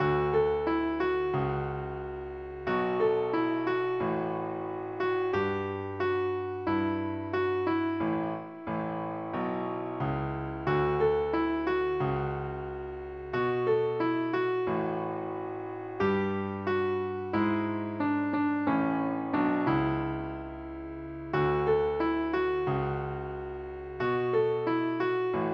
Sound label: piano slow; slow tempo; PSE Music; PlayScore version